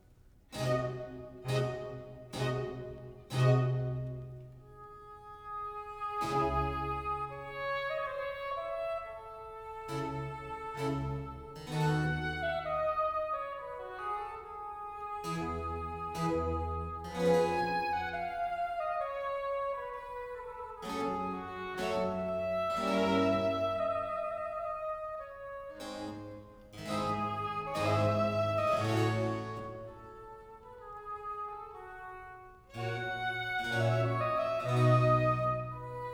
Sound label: Suite in F Major Adagio e Staccato; Haendel; Water Music